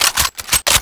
k98 single shot reload

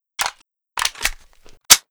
c96 world reload

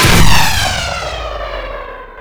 mortar shoot, weapons

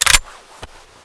Thompson Clip out

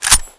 bar clip in

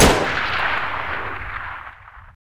mp40 shoot

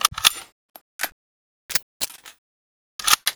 k98 world reload, weapons

